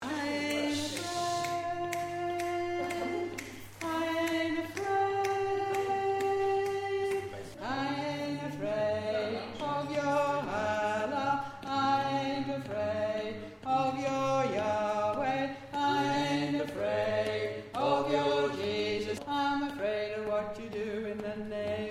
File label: bass